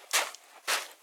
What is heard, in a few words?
Footsteps Sand